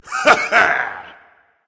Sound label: yay berserker; cuchulainn